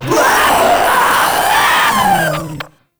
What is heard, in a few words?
Proto Infested Runner Vocal Attack; Warframe